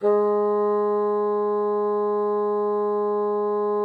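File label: bassoon piano G#3; Xsample VOL-6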